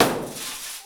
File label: Flourescent light breaking; SFX; IRL Recorded